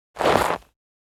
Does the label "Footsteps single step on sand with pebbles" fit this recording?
Yes